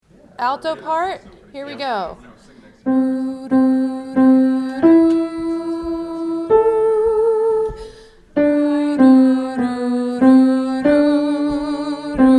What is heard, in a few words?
Alto